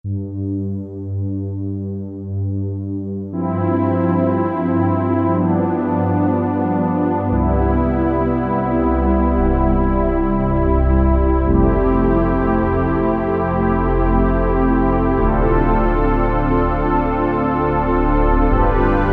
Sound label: Warm Pad, Alesis Z2 EuroDance